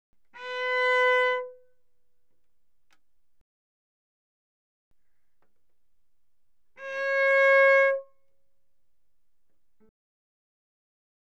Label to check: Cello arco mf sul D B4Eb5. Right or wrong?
Right